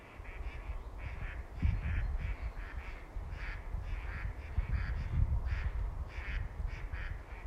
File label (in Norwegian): Stokkand